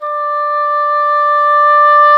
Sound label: English Horn, Orchestral Winds; Roland LCDP04 Orchestral Winds; Eng Horn Swl